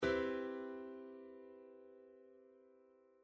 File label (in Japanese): cメジャーセブンス コード